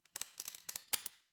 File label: glass crack